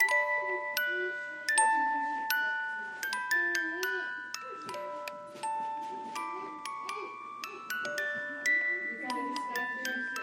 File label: musicbox radio